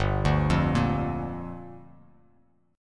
G Minor 7th; Gm7 arpeggiated; JGuitar

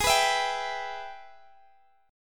Minor Major 7th Sharp 5th; Gsharp; strummed; x,x,18,16,17,15; G#mM7#5

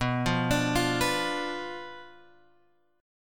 B Diminished B down Guitar Open G

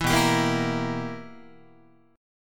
B Major 7th Suspended 4th Sharp 5th Guitar, chord; BM7sus4#5; strummed

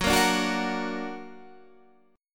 F sharp Augmented F sharp Guitar Standard; F#+ strummed; 14,13,12,11,11,14

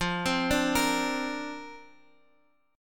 D-Minor 7th Sharp 5th F, chord

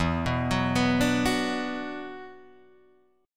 E 7th Suspended 2nd, Guitar, Drop D

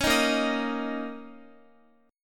B flat Minor Double Flat 5th Guitar, chord; Bbmbb5; strummed; JGuitar

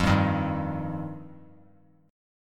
F 7th Suspended 2nd, Bass Standard; F7sus2; strummed; JGuitar

